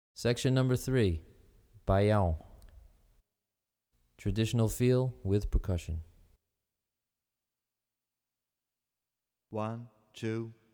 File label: Baiao Traditional Feel, Brazilian Rhythm For Drumset; DaFonseca, Weiner